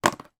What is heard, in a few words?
household box of cotton buds drop on top of other items in drawer